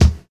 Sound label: dry kick drum one shot d sharp key